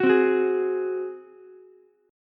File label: F sharp Suspended 2nd Flat 5th, Ukulele; strummed; down